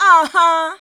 VOX, Singin

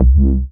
BASS Kreases, bass; M8; Electronisounds

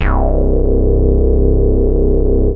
MATRIX C3, BASS; Q Up Arts; Dream Experience

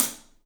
Paiste hat, drums; 3D Sound

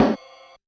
Realistic Concertmate-670 Rhythm Snare Ride; Rhythm Layered Kit

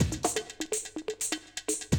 125, Classic Drum Loops

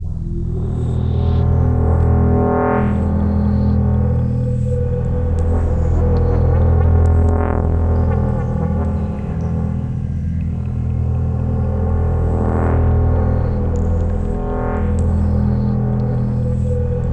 CARGO HOLD; East West - Twisted Textures-BT CD2

